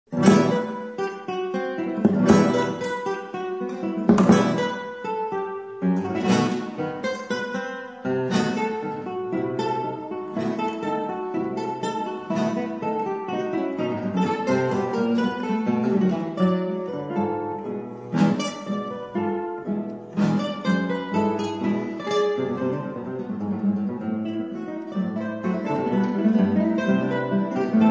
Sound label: tango